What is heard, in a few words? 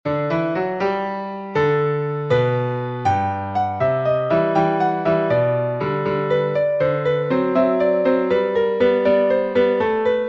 PIANO